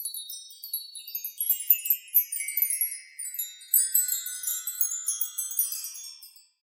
Chimes

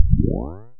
Squeegee

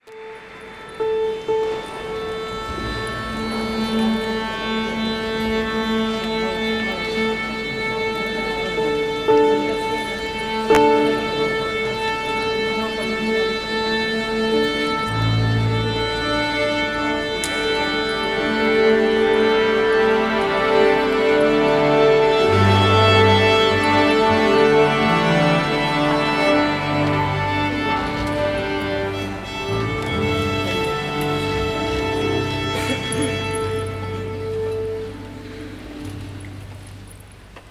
Orchestral Tune

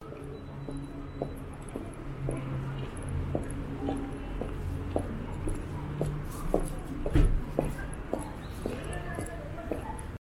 Ambience Woman Walking With Casual Shoes

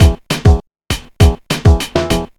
Ragga

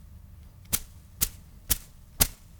Hitting Person With Broom

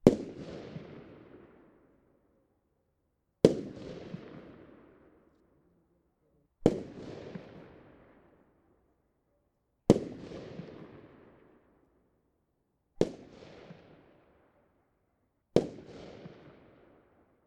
Fireworks Single Shots

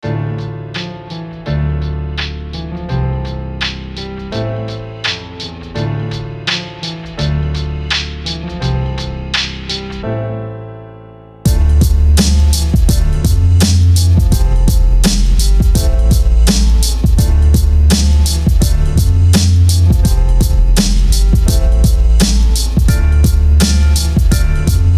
City Rap Beat

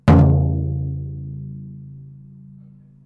Drum hit Low Hit

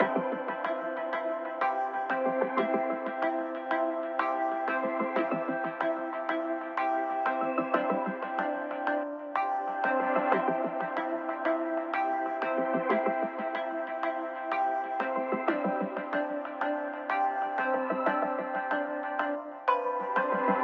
Cute Loop C Major 93bpm

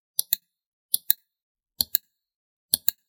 Single Computer Mouse Click

